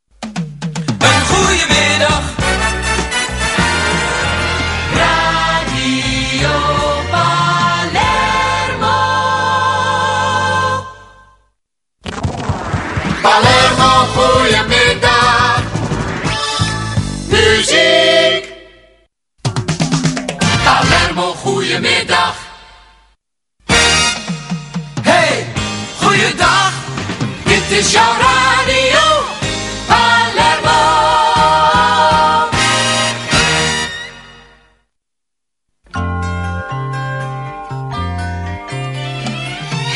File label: jingles; Palermo